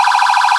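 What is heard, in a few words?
emergency vehicle phaser, sirens; galls street thunder 800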